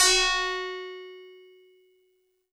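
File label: Tubular Bells DX100 F sharp 4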